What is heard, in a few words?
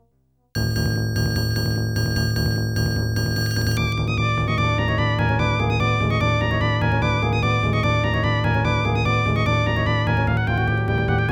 Boss Bgm; Daimakaimura; 3rd Boss Bgm; Capcom